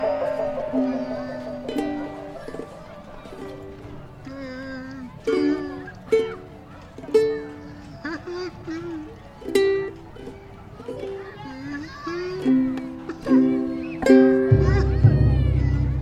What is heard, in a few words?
Ukulele Concerto